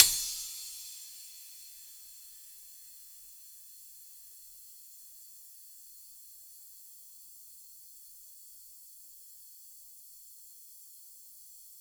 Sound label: piano body C3; German